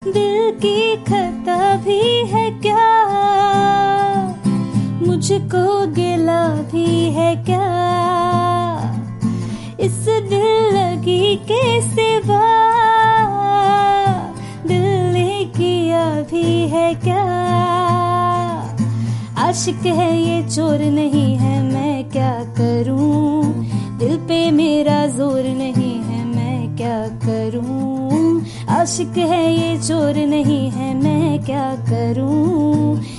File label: female version